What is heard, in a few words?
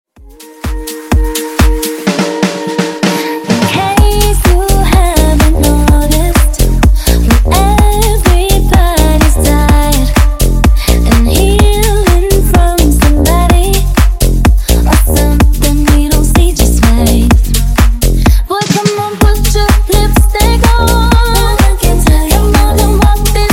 Funk Bootleg Mashup 126Bpm